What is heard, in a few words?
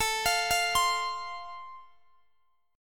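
A Minor Sharp 5th, Guitar DADGAD, chord strum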